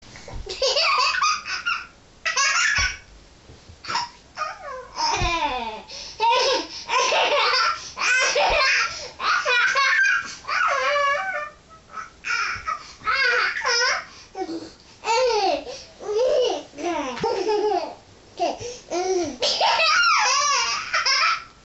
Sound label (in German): lacht